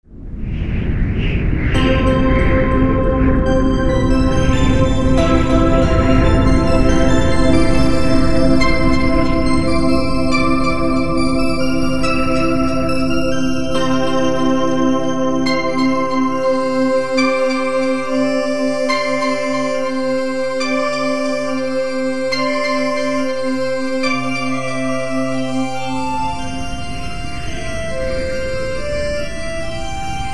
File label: Storm and Rain, home made Music in FL Studio